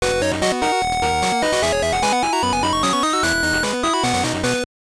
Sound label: jingle; Monster Race